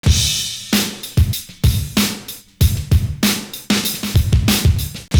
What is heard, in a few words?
GET ON SNARE; Univers Sons